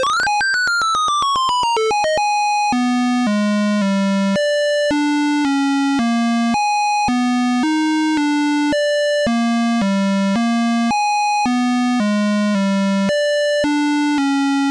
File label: Game Over (PC Speaker); Home Alone 2 Lost in New York; MS-DOS